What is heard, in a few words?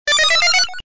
game Over; topfigures